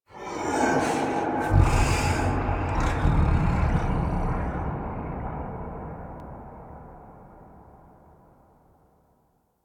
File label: Sky Breaths, Ambience; Warframe; Duviri